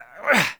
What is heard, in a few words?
Attacks Vocals Male; Bromius; Wazard Attacks; Envoy